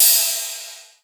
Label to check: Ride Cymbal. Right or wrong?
Right